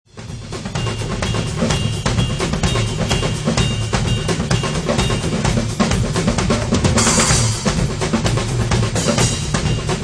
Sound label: Samba; drumlet